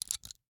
Rachet, vanadium steel, 8in, standard, locking, clicking, mechanical, new, socket release, clear; Eiravaein Works; Vaeyan IV